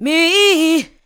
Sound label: ADLIBS